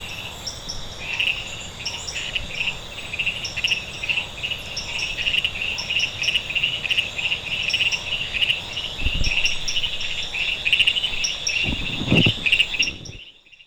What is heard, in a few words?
Dendropsophus leucophyllatus; ECourtois